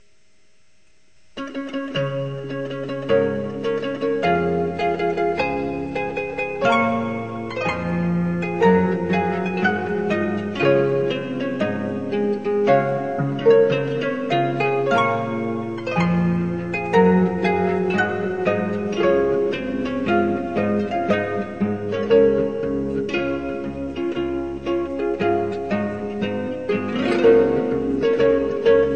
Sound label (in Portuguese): marcha, harpa